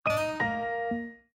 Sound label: Notify; Festival